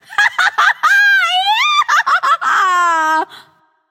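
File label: yay, female; smite; voicepack; hera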